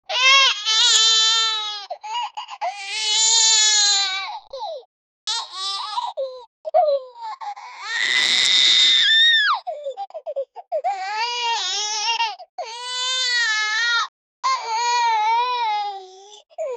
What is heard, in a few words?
baby crying and children